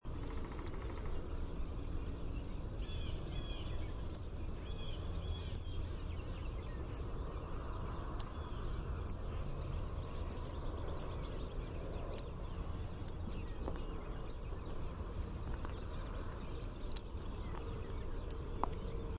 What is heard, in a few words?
RWBL, nature sounds